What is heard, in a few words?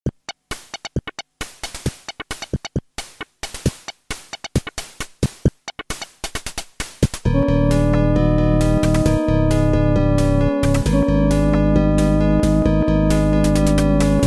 music theme; monster bash